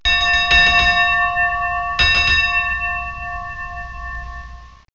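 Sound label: dinner bell; EFFECTS DOORS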